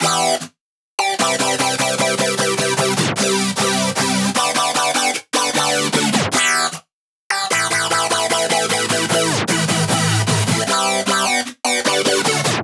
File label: chord loop 152 Emin; lyra